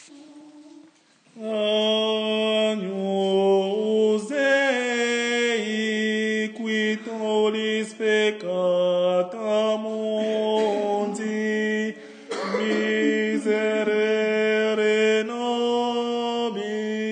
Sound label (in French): agnus ténor